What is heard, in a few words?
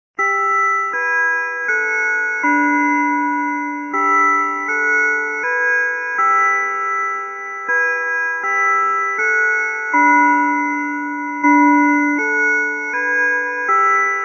Quartz Westminster